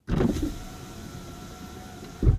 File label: window rolldown; Blind Drive